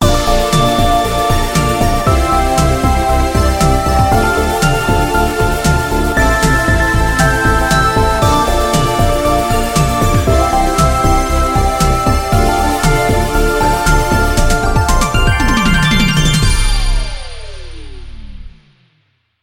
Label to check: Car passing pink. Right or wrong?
Wrong